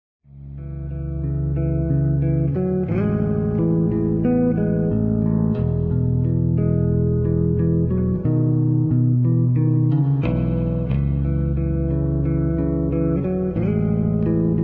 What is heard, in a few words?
Guitar Electric Bass